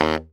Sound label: BARI FF D#1, Sax; Giga Samples Collection; BARI STEREO